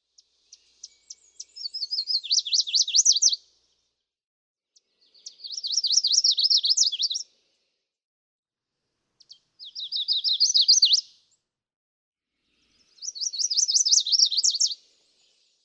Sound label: Chestnut-sided Warbler